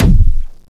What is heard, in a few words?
gun; madcars2; radicalplay